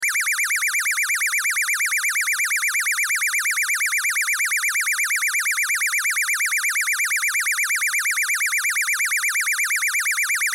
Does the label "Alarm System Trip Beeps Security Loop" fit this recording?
Yes